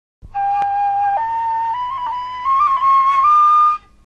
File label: Bayati tetra chord, a “Rast” Tetra chord on Sol (G)